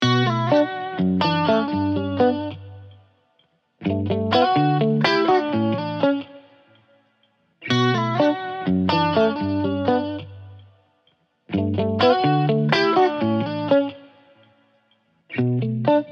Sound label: 125 electric guitar loop higher Bm